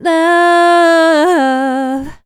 "Love" - Fmaj, Vocals, One-Words; GhostHack

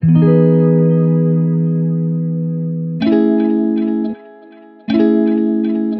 80 BPM E Maj Guitar; Loop Stems; Oceanside; Gems Free Teaser Pack

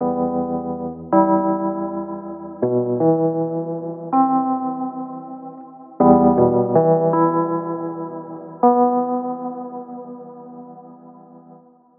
Retro Keys Loop Em 80Bpm; Granular; Lo-Fi; Granular Musical Loops; Ghosthack Advent Calendar; Lo-Fi Kit

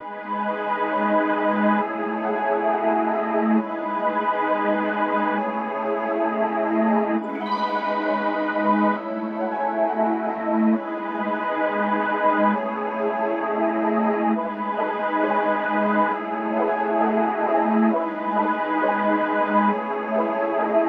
134 BPM C# Min; Skyline; Wavy

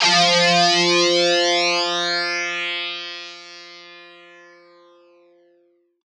Riddim Melodic Hit - F Synth Shred; One-Shots; Ghosthack; Riddim Essentials Volume 2

